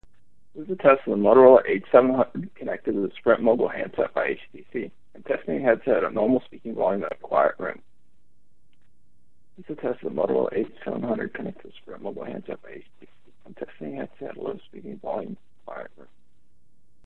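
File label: Quiet room, headset; Moto H700B; Mogul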